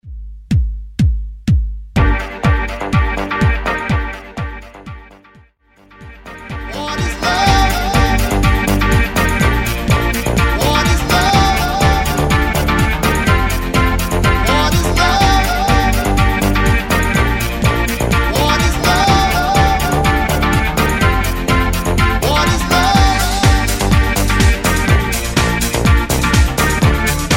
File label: 90s Dance Redrum